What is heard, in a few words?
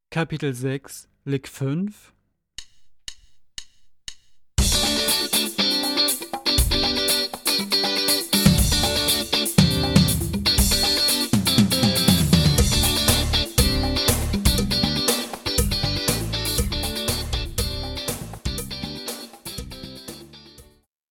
Latin Style Guitar